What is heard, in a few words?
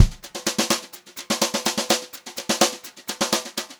JZ BEAT, Brushed Drum Loops; USB Soundscan vol.39; AKAI; 90 s Sample CDs; 126 JAZZY